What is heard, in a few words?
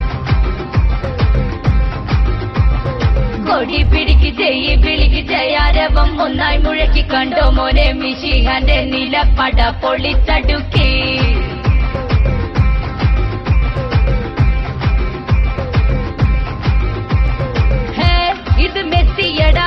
argentina fans